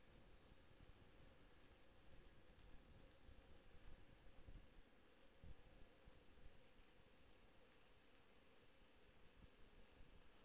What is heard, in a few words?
HYDROPHONE 16000Hz; JASCO AMAR HYDROPHONE 740